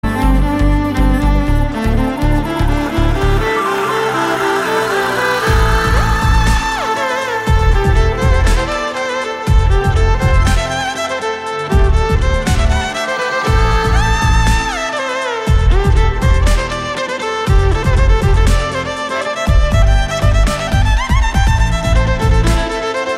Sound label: Violin Recital Ringtone; ringtones